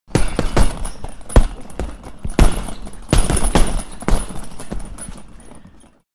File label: Man in boxing ring practising punching